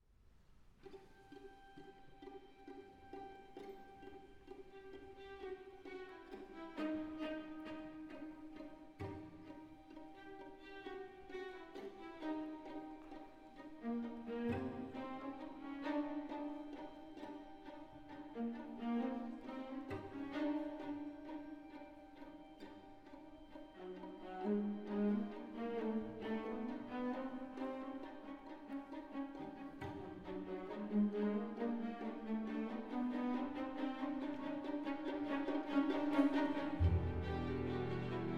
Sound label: string orchestra; Harry Sdraulig